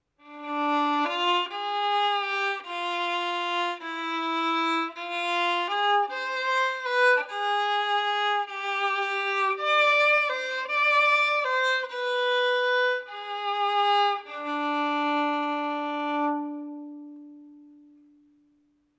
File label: Hare theme violin